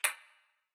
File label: Bullet Bounce